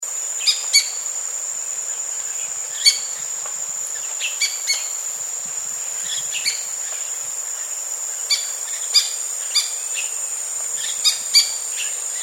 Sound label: maroon tailed parakeet